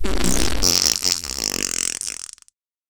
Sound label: bantha fart